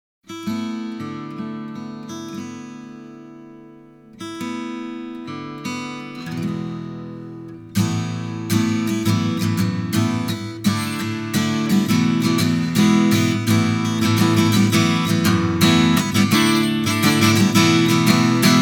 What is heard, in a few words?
Guitar (Intro); Ojamajo Doremi Movie; Eiga "Majo Minarai wo Sagashite" Music Collection